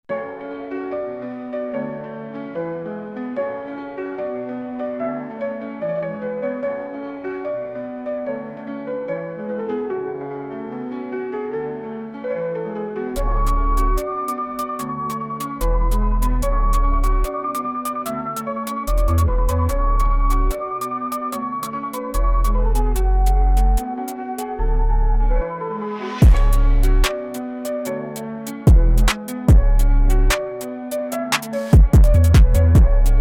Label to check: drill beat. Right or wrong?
Right